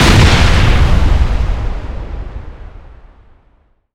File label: Explosions Explode Ground; DCS